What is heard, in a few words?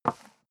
step leather shoes wood parquet slide short; schritte